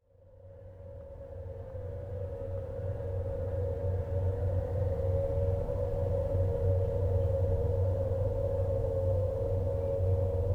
Wind 6A, Saskatchewan Window

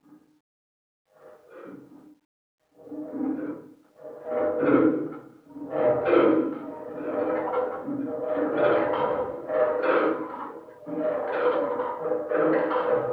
Locks Gated, Chalice Well

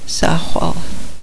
grass

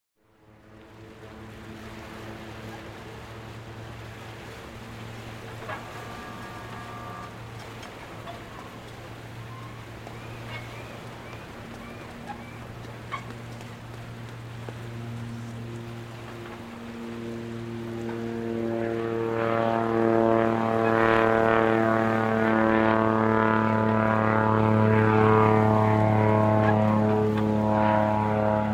Harbour Ambience

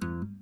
saber hit

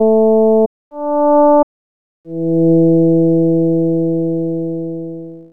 adsr

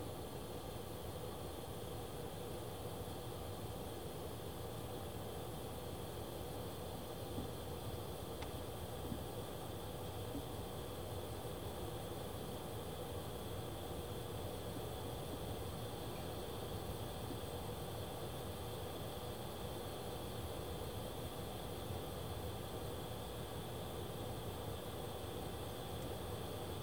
ambient inside

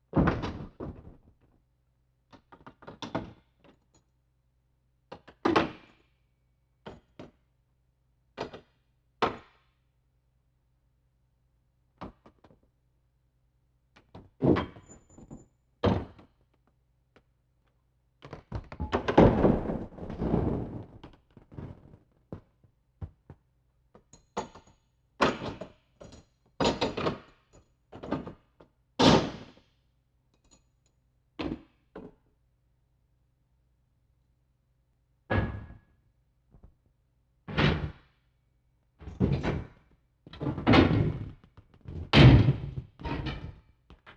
Locks; Chalice Well

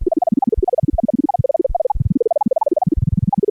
Grains Fast Sine; Chalice Well

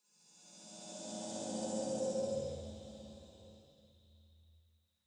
Bird Fly, Garden

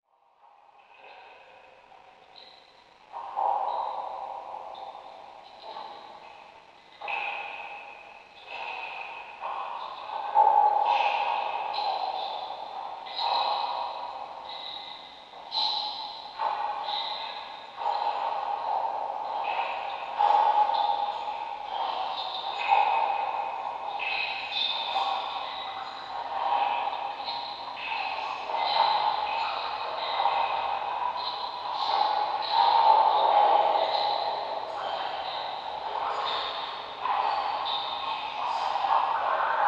Well Locks

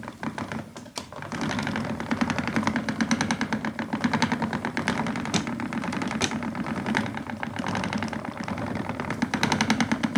Spinning Wheel

